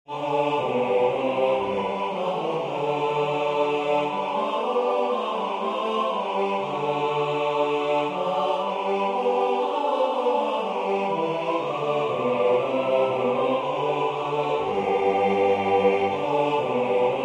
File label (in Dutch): Hymne Bas